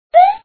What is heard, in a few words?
Jump sound; Wario Gets Straight